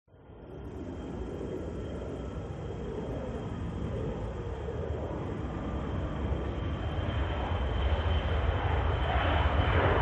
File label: Airplane Jet; audio hero